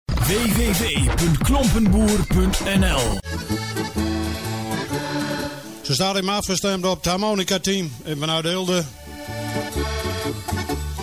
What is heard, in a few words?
Harmonica